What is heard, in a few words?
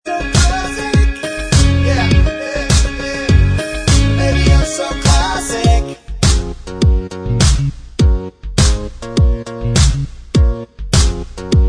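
backing vocals, backing track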